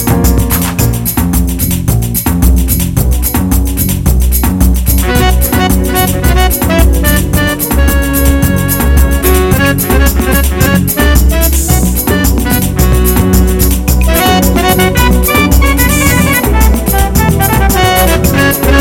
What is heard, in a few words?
Beautiful Samba